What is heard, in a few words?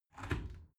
window open soft